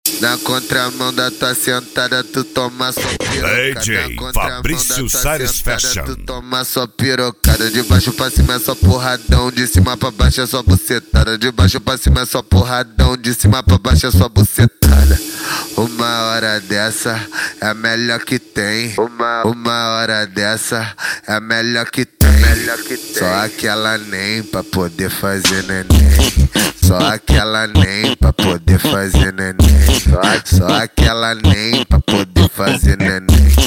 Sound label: funk